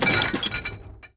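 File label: crate cocktail; bedna koktejl; Mafie 1 cz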